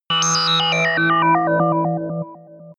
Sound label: sci-fi dark planet, digital